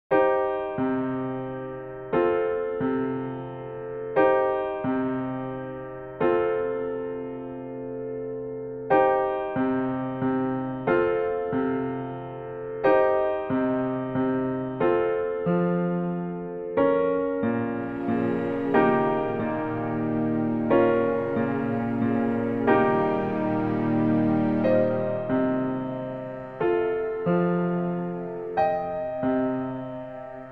Peaceful Moment

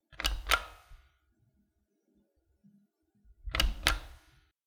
Elevator Button

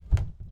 Cooler Lid Opening

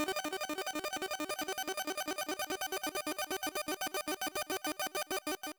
Retro Repeating Effect

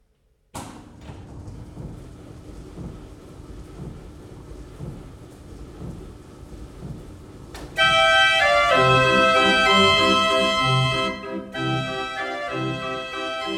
Carousel Organ

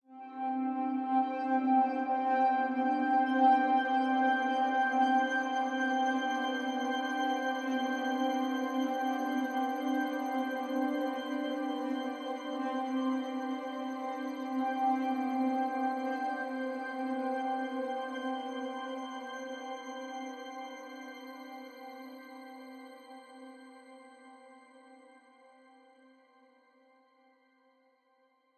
Drone Reverie C

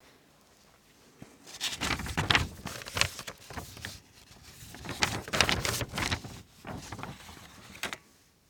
Paper

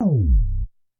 Tape Slow

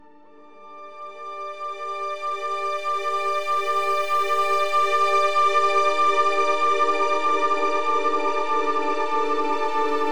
Long String

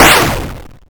Gunshot

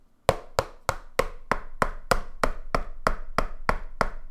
Hammer

